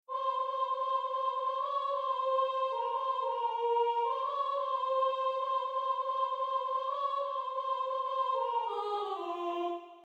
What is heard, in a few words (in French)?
voix